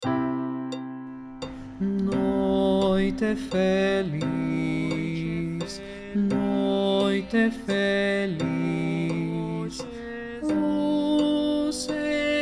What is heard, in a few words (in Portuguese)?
Soprano